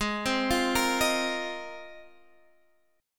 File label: Bb 7th Suspended 2nd Suspended 4th